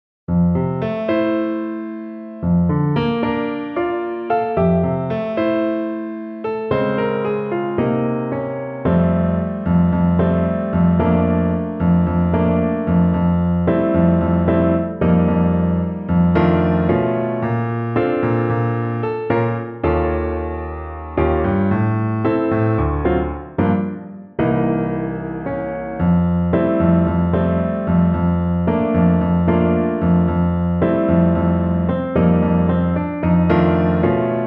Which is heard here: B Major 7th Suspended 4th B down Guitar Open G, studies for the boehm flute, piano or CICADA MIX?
piano